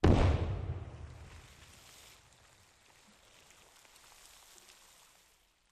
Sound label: Undrewater Explo Med